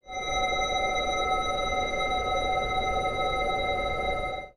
slow motion

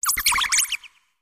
tickle

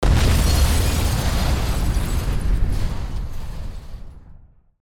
big explosion

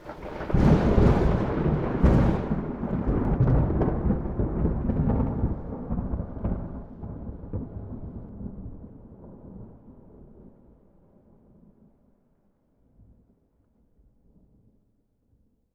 thunder

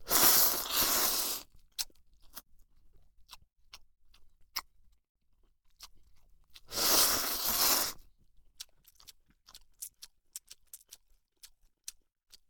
ramen

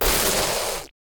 drink spout

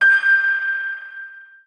piano single

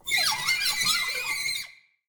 chair move

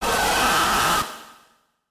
monster

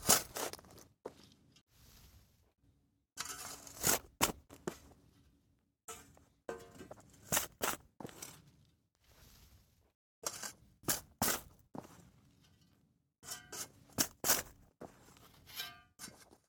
shovel digging

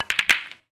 billiard break

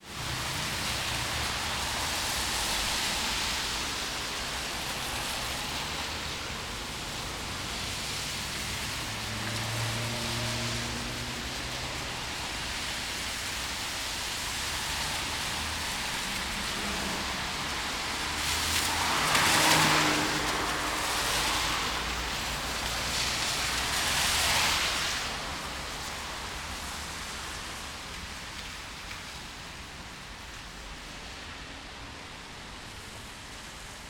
town rain

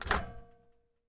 microwave open

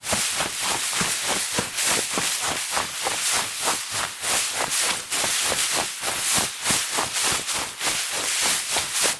dash tussock

